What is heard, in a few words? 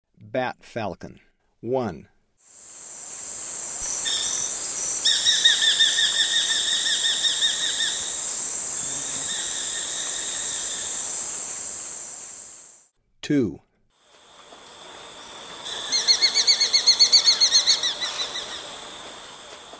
Bat Falcon; S. America